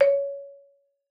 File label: C#5, marimba; Xsample VOL-4